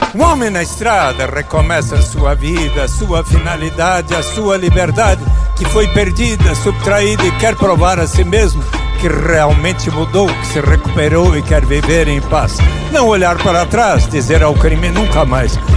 rap; suplicy

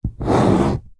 pen drawing line

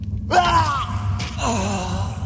death sound effect; hunt showdown

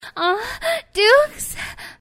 moan

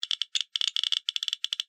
geiger counter clicking

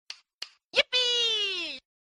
yippie sound; settlers 4

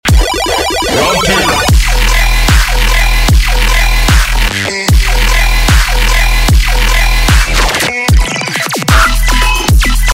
remix

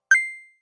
coin collect; subway surfers

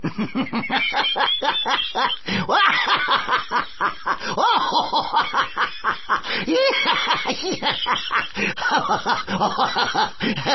duck laugh

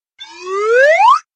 cartoon slide

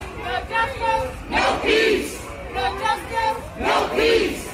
protesters march chanting no justice no peace; in columbia

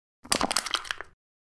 red bull can crushed

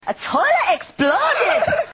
toilet exploded

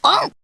woah; NecoArc

